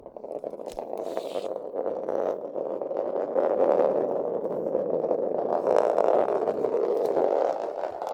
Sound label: Golf Balls Rolling